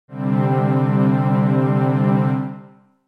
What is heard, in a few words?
C C Suspended2